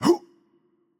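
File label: Attack Light, Czech; bloom minigame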